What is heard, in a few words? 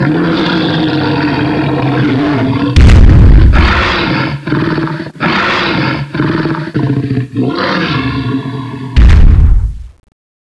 revenant death; cstrike; npc revenant; revenant zbs